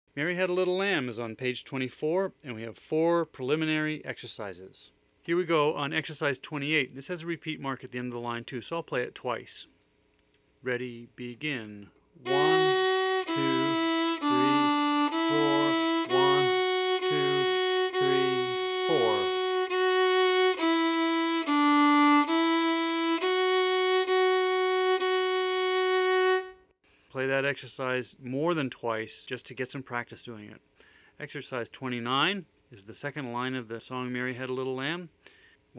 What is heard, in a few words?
Mary Had A Little Lamb